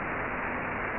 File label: noise